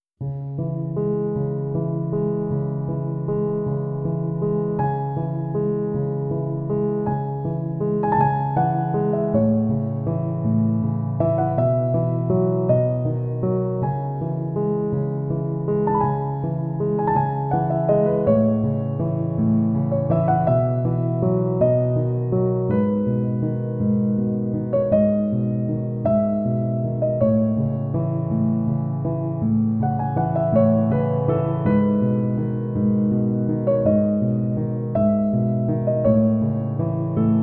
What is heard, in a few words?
Piano Study Music; Music for the Brain